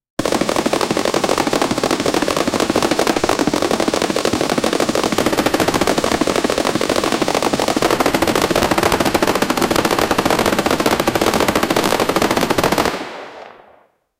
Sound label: rapid fire with ak 47; firearm